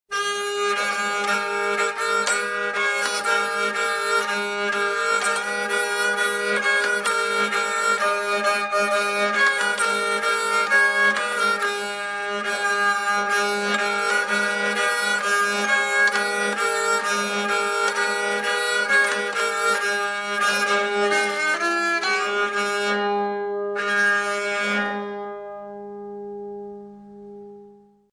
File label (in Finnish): nappainviulu